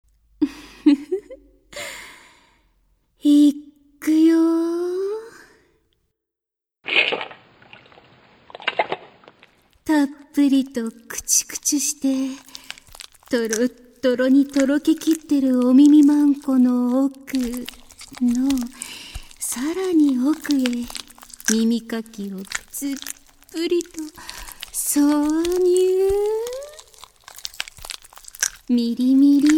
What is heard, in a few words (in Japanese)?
耳かき 脳クチュ, ASMR